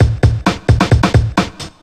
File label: drum loops; bb drum loops